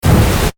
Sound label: Flame Booster Short, Burst; Science, Fiction; Spacecraft; BlastWave